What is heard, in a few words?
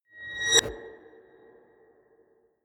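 musical swell short reverse, drones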